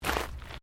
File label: Footsteps Gravel Step; Crocs; Footstep Foley; Human